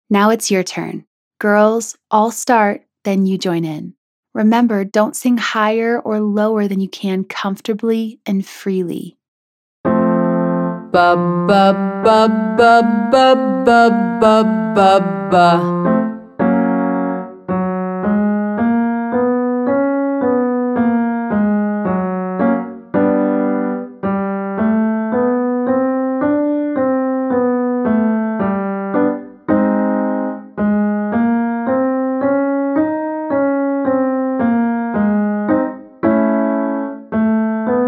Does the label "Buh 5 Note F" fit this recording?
Yes